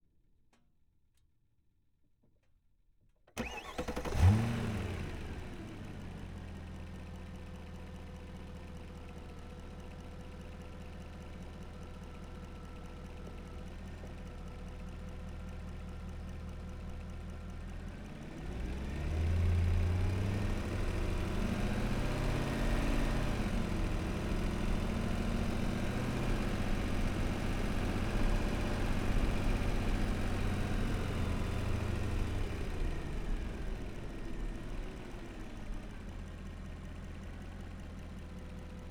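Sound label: Mercedes C63, Onboard, Start, Slow Drive, Stop, Reverse, Stop, Drive, Stop, Off, Engine; Mercedes AMG C63 2009; Pole Position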